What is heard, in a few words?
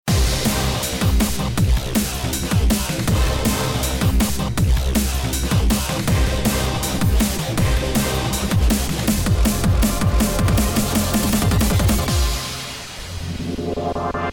Boss Loop; ICEY